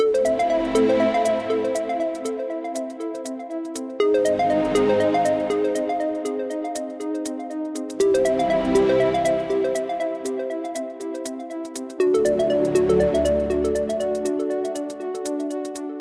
intro Free Spin Loop; double stacks

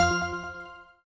count Up End; jacks or better multiple hand

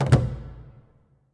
Continue Button Press; aloha

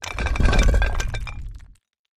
tiles shaking start; lost relics

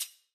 button click; pyramid

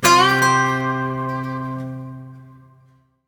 small Win Riff; dead or alive 2

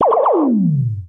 heartbeat; disco danny

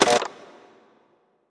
quick Stop; eggomatic

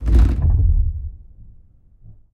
nudge step; rise of maya

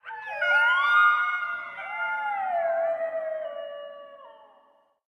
howl; the wolfs night

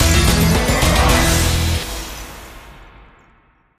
big Win Loop End; pyramid

